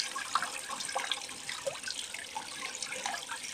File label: loop water; water splash slime